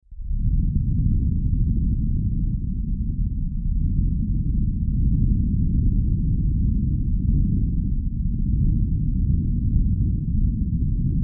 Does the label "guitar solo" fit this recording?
No